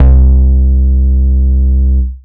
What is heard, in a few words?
Merc Bass; Drum & Bass; AMG - Prototype Drum & Bass